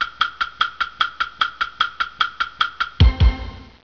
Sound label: tictoc